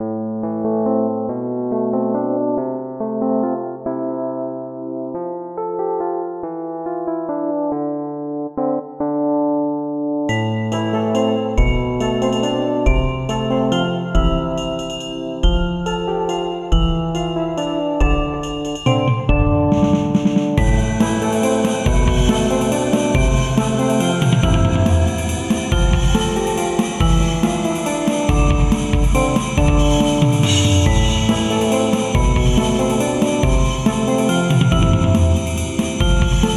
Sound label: gloomy warcry